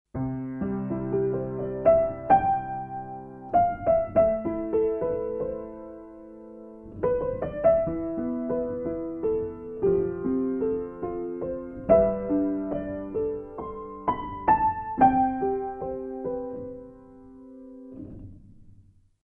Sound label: Piano damped mechanical; Reason 10; Radical Piano